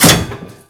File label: Ocean Piston; Ambience; Props; Warframe; Grineer Ocean